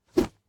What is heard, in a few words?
Melee Attack